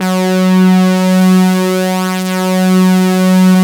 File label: SYN ANALE; Sound & Vision - Gigapack I CD 2; JD 800/990